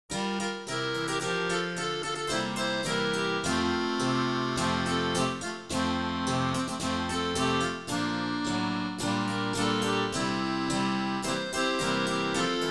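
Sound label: jingle bell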